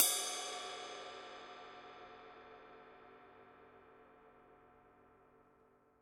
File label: paiste signature dark energy 21 dark energy ride mark i body